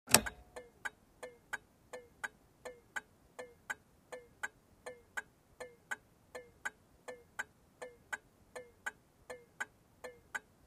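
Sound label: Car turn signal switching on and off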